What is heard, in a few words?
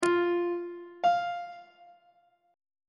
g double flat note on treble clef